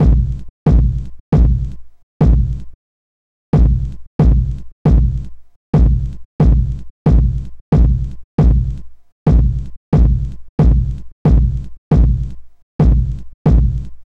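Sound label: Kick 136 D minor Wet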